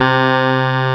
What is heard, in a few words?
SYN, Wavestation